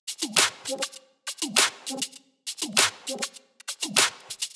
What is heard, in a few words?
100 Future Wobble Top, Percussion; Loops; Touch Loops; CosmicFunk